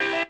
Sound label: synth stab